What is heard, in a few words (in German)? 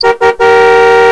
hupe